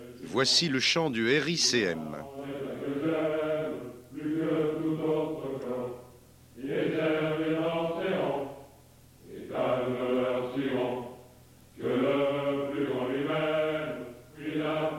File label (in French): Chant; RICM; Troupes de marine